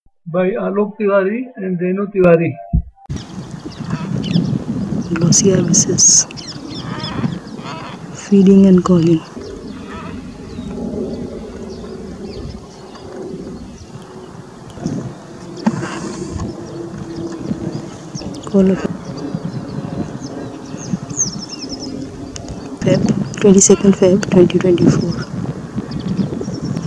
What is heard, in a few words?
Glossy Ibis audio; Alok